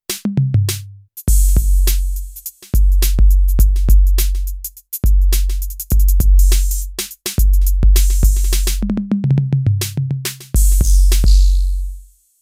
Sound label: TD1 Set